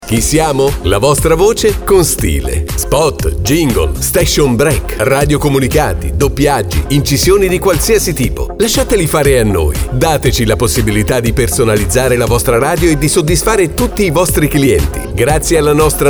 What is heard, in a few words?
spot jingles